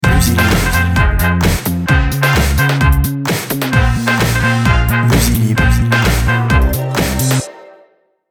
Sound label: electro swing